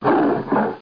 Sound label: WOLF; THE REALM